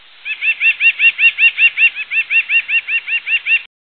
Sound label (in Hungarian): madar, allat